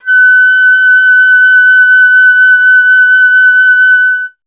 FLT FLTF#, Flute; Miroslav Vitous - Solo Instruments VOL-2; Solo lnst. II

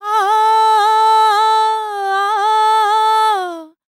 World Voices, INDIA; ILIO; Vocal Planet

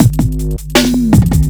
Speed Loop Cut Time, Drums Beats

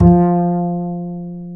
F 4, Bass; Spectrasonics; Bass Legends

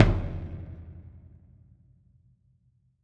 Ceremony Drum; Sonic Foundry (Sony Creative Software); Joe Vitale Latin Percussion

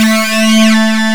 SYN, SYNTHESIZER; DIGI WAVE #1

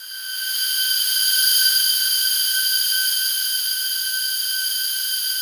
SLOW STRINGS

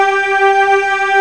VOICE UNI; AKAI S6000 CD-ROM - Volume 1; VOCAL ORGAN; B VOICE & BELL

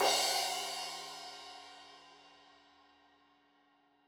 Crash 15in L edge, Cymbals Stereo

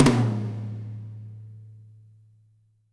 TOM 2L R, ROCKER KIT

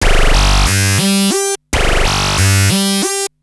Analoop, Industrial Analog; Analoops; Sonic Foundry (Sony Creative Software)

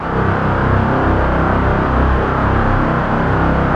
SPACE STRINGS, Yamaha VP-1; Music Store

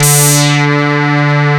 OSCAR D3, synthesizer; Hollywood Edge - Giorgio Moroder Rare Synthesizer Collection

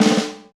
Folk Snare Ghost; Snares

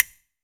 CASTANETS

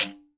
10 PANDE, PANDEIRO

